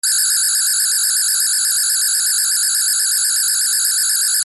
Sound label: alarm